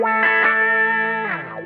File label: GUITAR